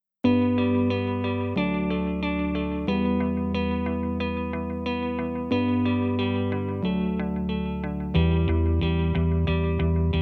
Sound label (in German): Applaus Applaus